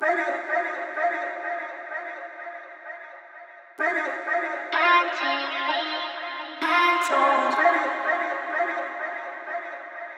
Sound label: 127 BPM G# Min Vocal, Euphoria; Cymatics; GHOST (Beta Pack); Allured